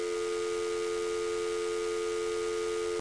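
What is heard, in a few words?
dial tone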